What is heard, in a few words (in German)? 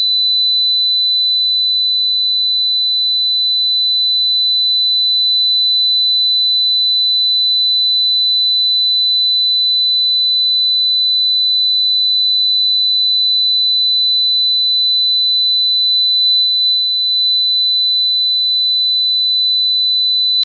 Pfeifton Hell